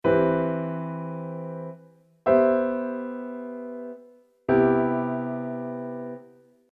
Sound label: C maj7 drop 3